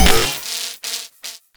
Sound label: Electro Mag Charge Up End; Warframe; Corpus